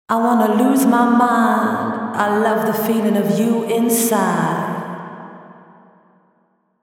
Vocals FX Large Hall; Presonus Studiolive ar22 usb

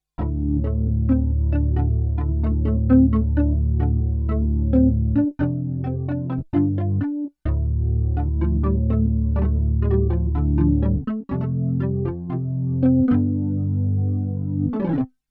synth phasy prophet

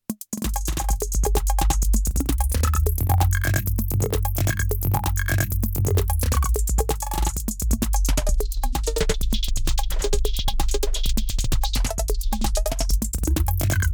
Erica Synths Black Hole DSP2 Pitch Shift Delay

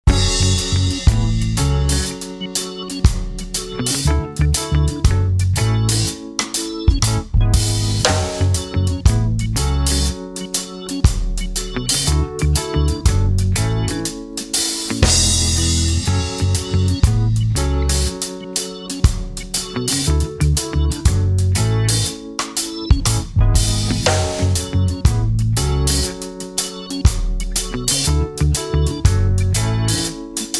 Reggae F sharp minor

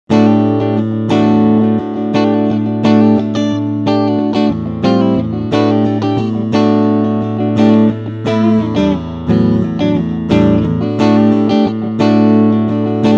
clean strumming beide picku

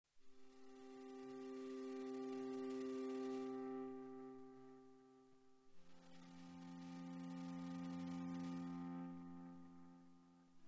Boss DM 101 Synth Classic

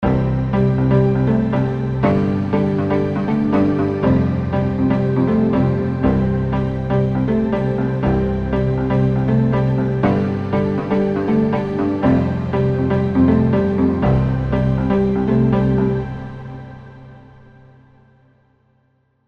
Cinematic Grand Synth Pop 80s Dream; EZkeys

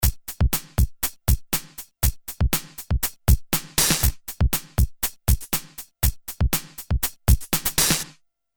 DrumLoop saturator JFET; Audified Synergy R1

